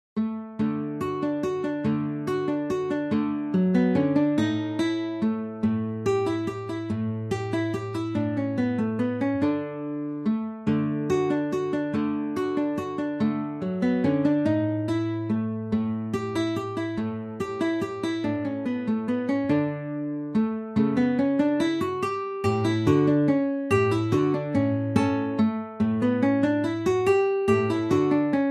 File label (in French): valse; Carcassi, Matteo